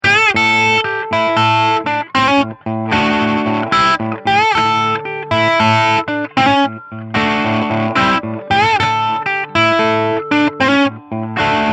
Crunch Picking; Dragon

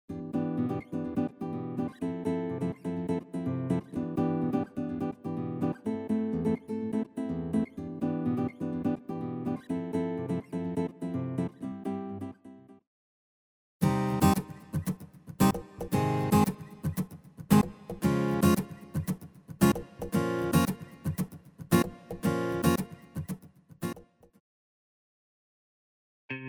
Mega Voices

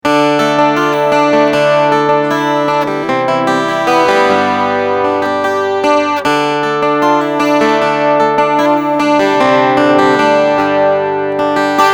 Picking EQ off

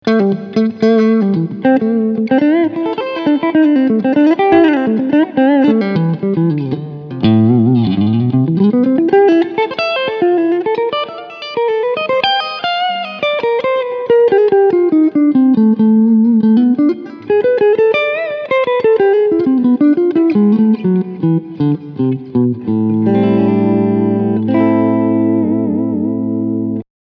Front HB SC Clean

